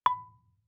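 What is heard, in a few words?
Marimba cord fortissimo B5; MIS Pitches - 2014; Percussion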